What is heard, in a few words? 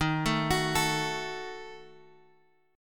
D sharp Diminished D sharp; D#dim Chord {X 6 7 X 7 5} arpeggiated